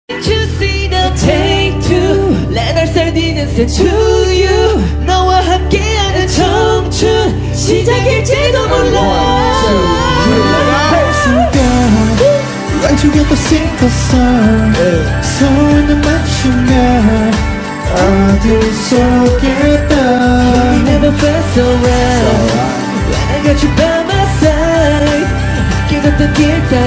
korean ringtone

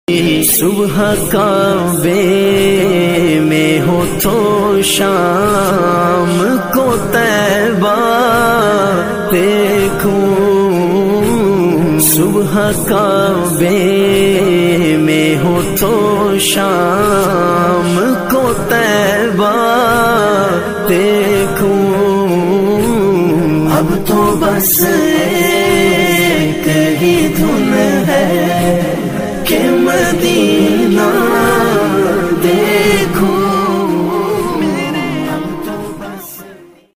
Islamic ringtones

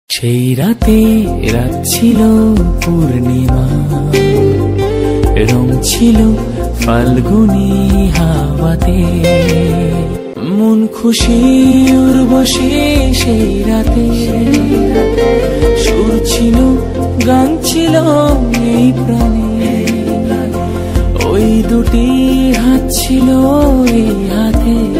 bengali ringtone

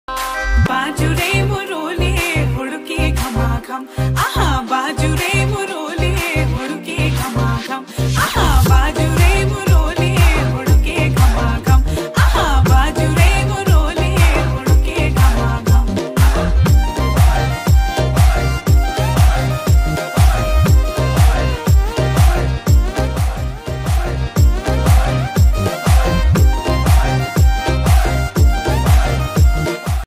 uttrakhandi ringtone

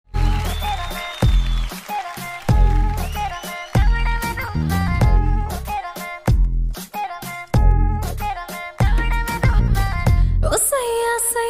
hindi ringtone

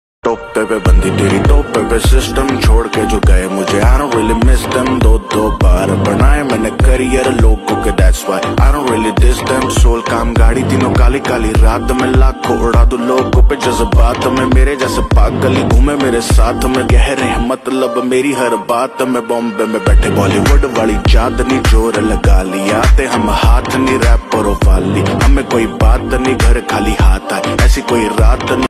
hindi rap ringtone

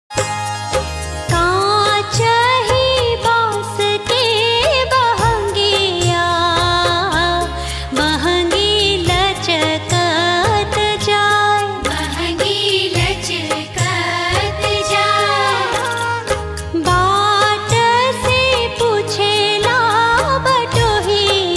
devotional ringtone

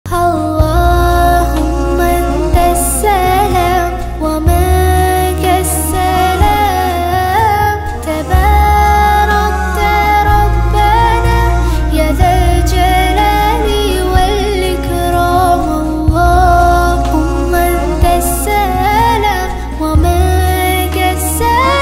arabic ringtone

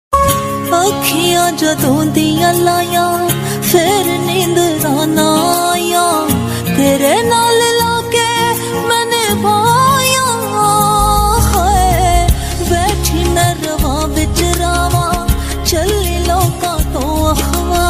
OST pakistani ringtone; Udaari

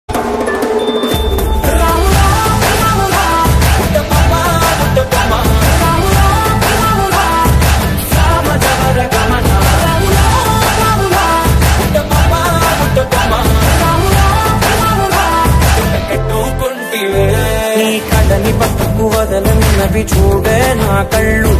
remixed ringtone

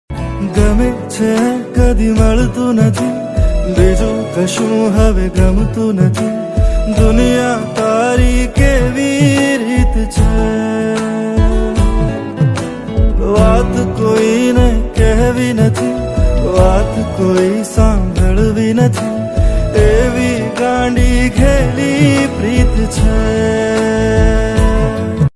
gujarati ringtone

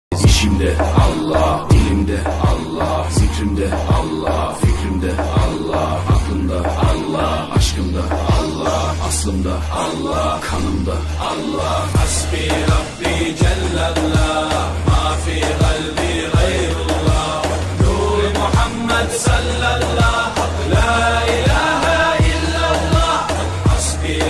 arabic ringtone

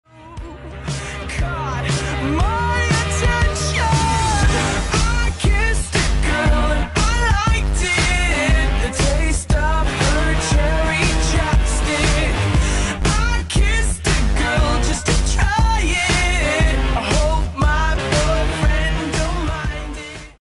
english ringtone

